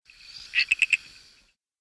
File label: Hyla Sarayacuensis; amphibia